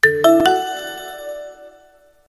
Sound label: nokia calendar